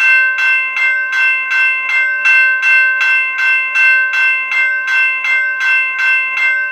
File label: Train Crossing Bell